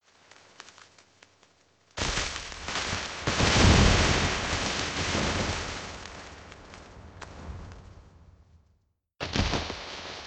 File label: Snow Collapse And Fall